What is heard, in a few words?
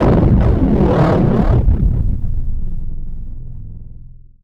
HITS, Special FX Twisted; USB Soundscan; AKAI